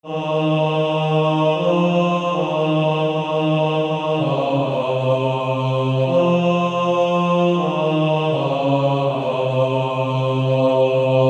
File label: Male Choir